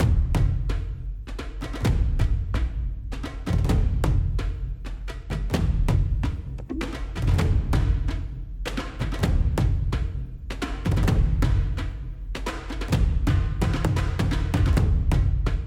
Tribal Drums Loop

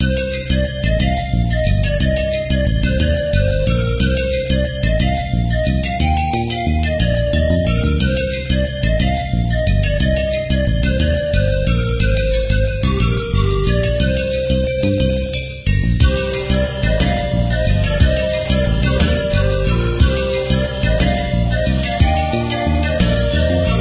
Dungeon; Adventure Bar Story; Android game music

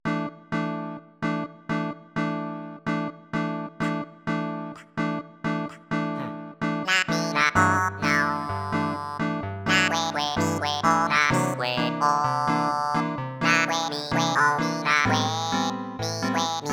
Smooth Bossa

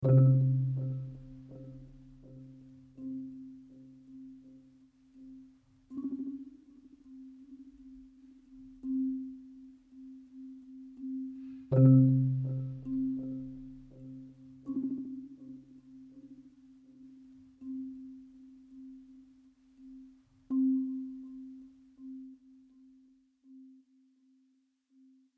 Marimba and Drums Mood

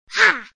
yell; chibi knight

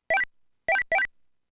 Shooting Sound; Buki Shotto Saundo

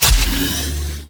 Attack; Shielder Sfx

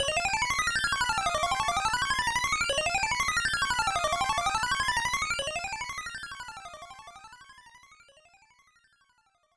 Teleport; Mole Mania; GB

